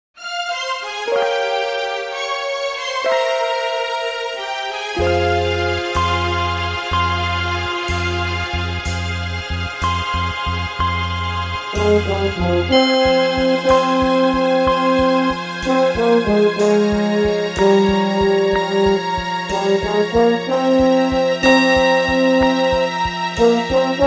Fm alto

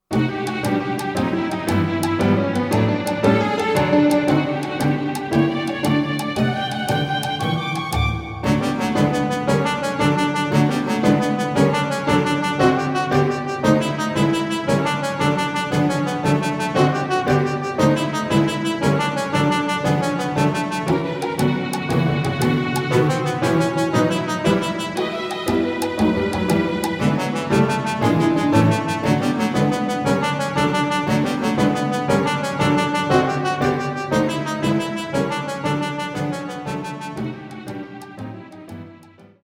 trombone plus; album in trombone

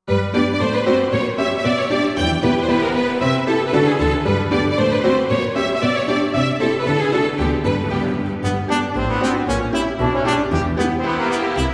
polka trombone plus; album in trombone

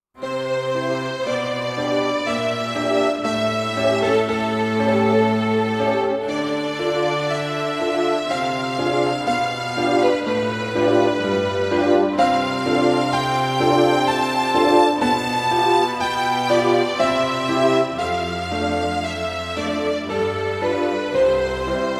trombone plus

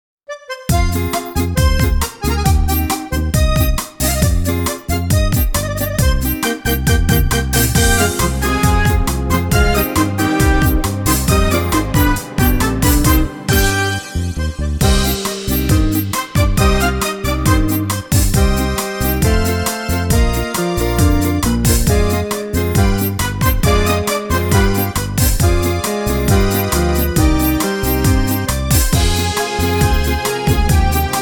Cm alto; minus

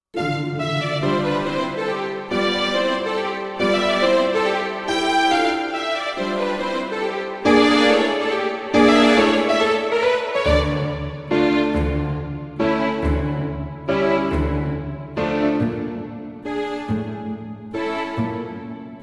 mazurka minus trombone; album in trombone

